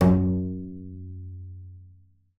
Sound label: BING F#2, BASS FINGER; Xsample VOL-5